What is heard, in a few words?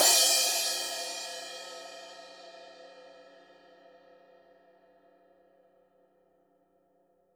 Trash, drum